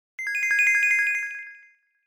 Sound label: ringing bells; standard ringtones